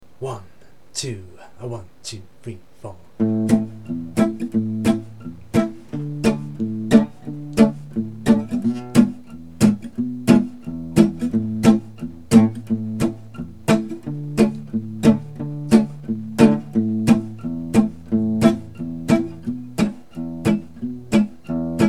chord study, guitar

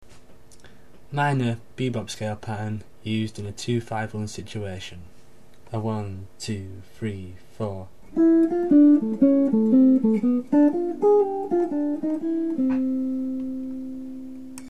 minor bebop scale pattern ii v i, guitar

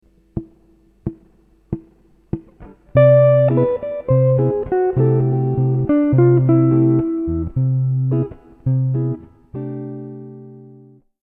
Modal Licks Harmonic Major, guitar

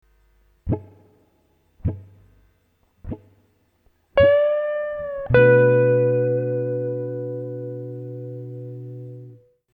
Minor Blues Licks Example

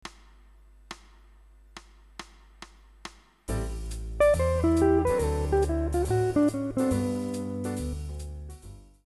ii V I Licks

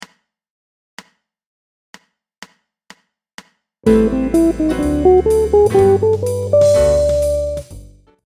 Major ii V I Outlines, guitar

